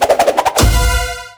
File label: Character Attack